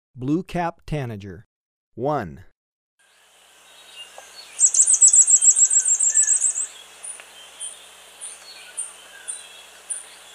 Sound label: Blue-capped Tanager, Birds of Ecuador; S. America